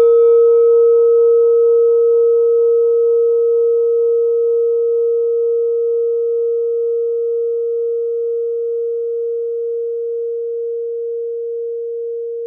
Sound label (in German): klangschale nepal, Klangschalen Nepal